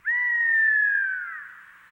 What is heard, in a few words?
Bird - Hawk Scream; Ed Edd n Eddy; Adromulus Pack; EEnE